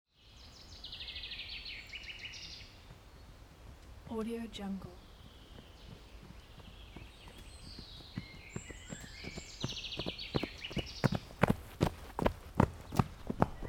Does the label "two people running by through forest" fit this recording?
Yes